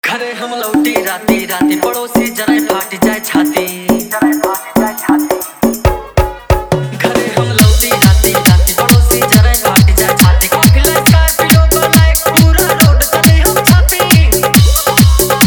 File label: New Bhojpuri Hard Dhollk Bass, Dj Song